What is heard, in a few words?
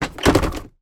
van glove box open mercedes sprinter